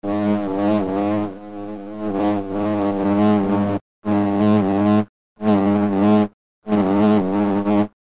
fly

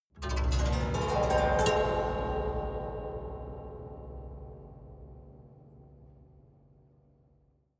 haunted strings up stroke fast soft

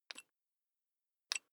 electric drill bit fasten wrench remove

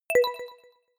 notification short bell

